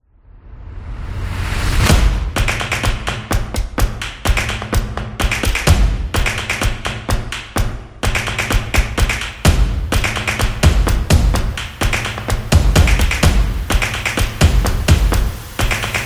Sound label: Snap Fingers